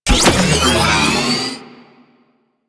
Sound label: Rail Jack Rifle Secondary Charge Up; Warframe; Tenno